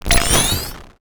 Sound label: collect consume item pick up robotic; power up